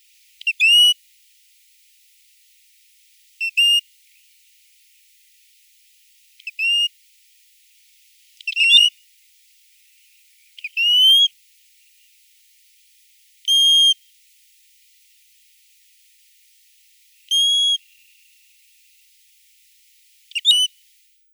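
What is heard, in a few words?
Asian brown-winged plover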